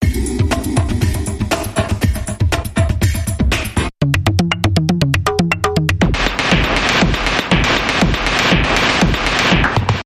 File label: Ogre Dance Bumper